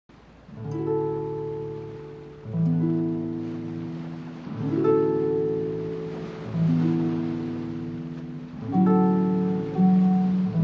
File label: Harp